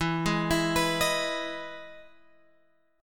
E Major 7th E down Guitar Open G

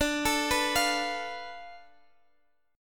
F 6th Flat 5th D, chord

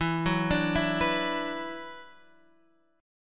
E 7th Suspended 2nd, down, Guitar, Half Step Down

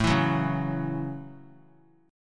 A 6th; strummed

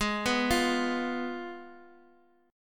Ab Minor 6th Ab, down; Abm6 arpeggiated; JGuitar

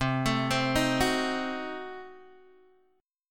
C Diminished 7th C, Guitar Open G

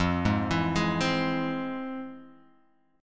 F Minor Major 7th, Guitar Half Step Down, chord

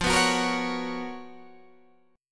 C sharp 13th F

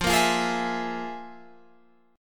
F Minor Major 7th Double Flat 5th

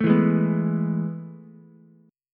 A Major 7th Suspended 2nd, Ukulele; AM7sus2 strummed; JGuitar

